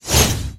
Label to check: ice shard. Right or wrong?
Right